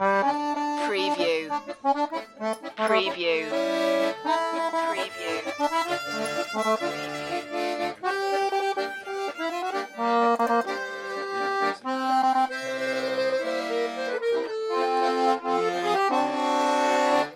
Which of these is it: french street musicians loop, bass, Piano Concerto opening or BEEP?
french street musicians loop